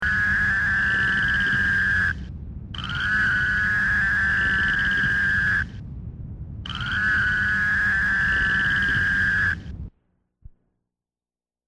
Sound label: Fowlers Toad; wildlife